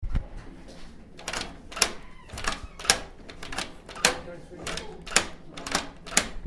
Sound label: door; indoor; Fermynwoods